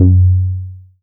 Rubber Bass; Best Service Gigapack 2

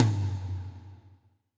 Tom Mid Wet, Alesis D4

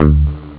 funk gt; jazz jackrabbit 2; M8

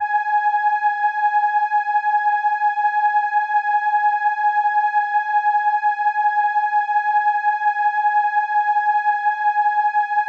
Horn G#6, ARP Solina

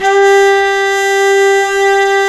mute flute, hybrid winds

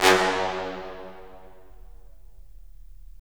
BRS, Roland LA Composer

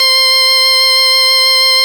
VOX C4; Big Fish Audio; First Call Vintage Keyboards

